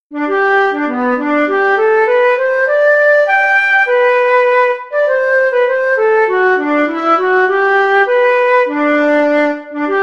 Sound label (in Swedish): Ganglat; fran Skarsjodal